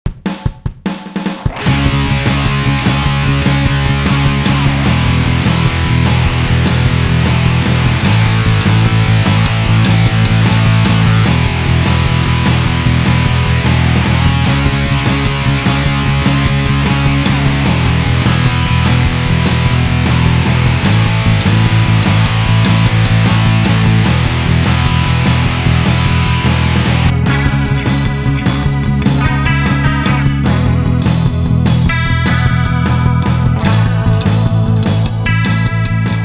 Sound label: me-80 90s alternative